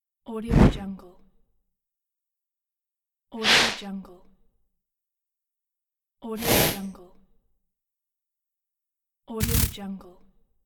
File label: snow under foot